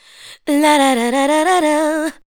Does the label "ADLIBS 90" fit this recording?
Yes